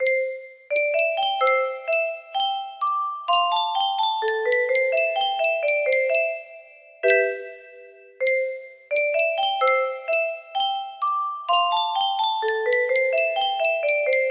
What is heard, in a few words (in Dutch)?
yunsheng custom tune muziekdoosje, music box melody